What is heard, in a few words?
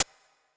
Hihat; Ghosthack Advent Calendar Day 4